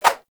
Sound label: Spheres Throw Whoosh; Warframe; Weapons; Solstice Spheres